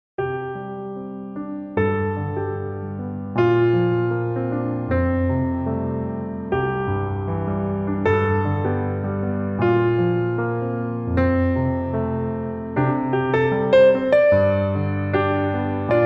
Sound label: Jazz